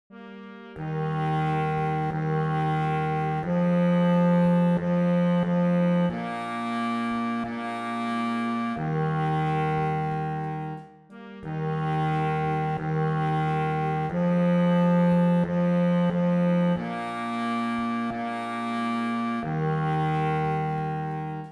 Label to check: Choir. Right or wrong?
Wrong